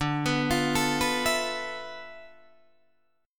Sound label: D Minor 6th Add 9th D, Guitar, Drop D